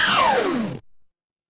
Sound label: retro game laser